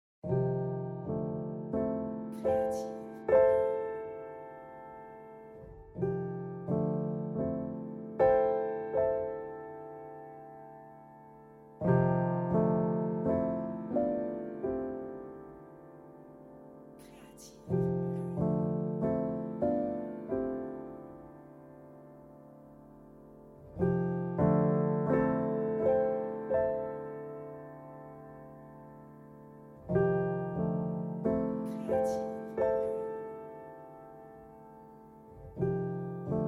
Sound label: piano